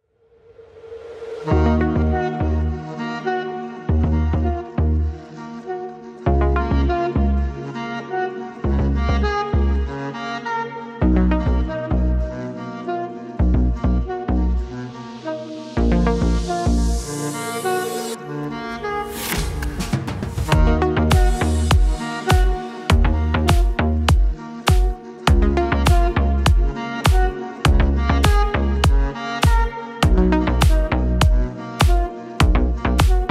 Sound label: sax end remix